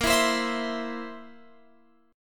A sharp Suspended 2nd Flat 5th A sharp, Guitar Open F; A#sus2b5 strummed; x,17,16,19,19,19